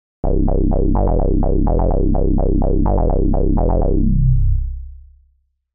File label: 1 osc